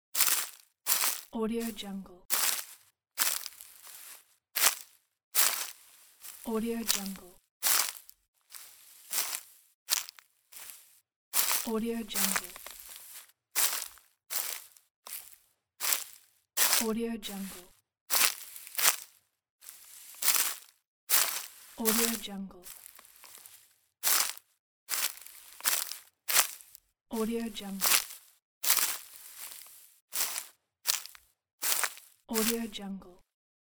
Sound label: footsteps in forest